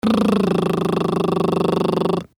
Mundflattern

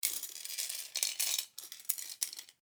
Sparschwein

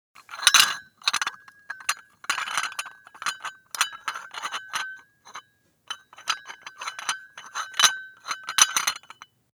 Tasse auf Untertasse

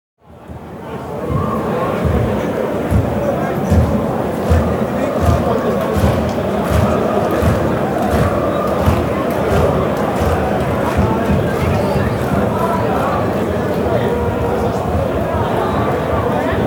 Stadion unter der Tribuene

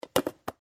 Tupperbox

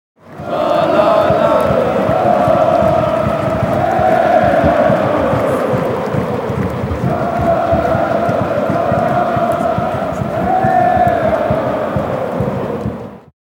Stadion Fans und Gesaenge

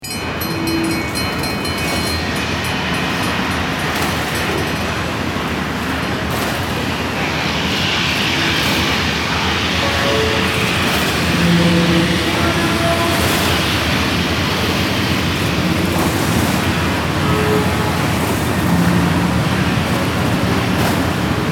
Hafen Hallenhafenbecken

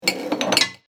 Tasse einraeumen

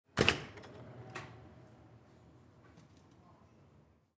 Ofen schliessen

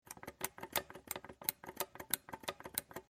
Seifenspender

